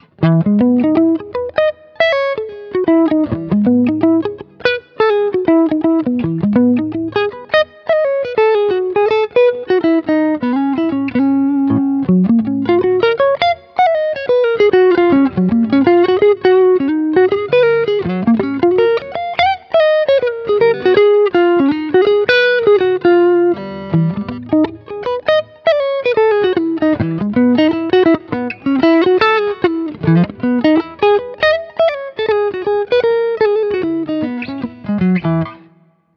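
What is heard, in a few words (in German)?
Hals Singlecoil, guitar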